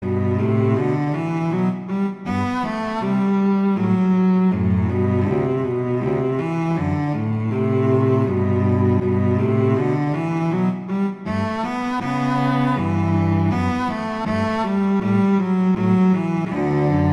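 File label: Duet, Andantino grazioso, two cellos; Mazas; Duets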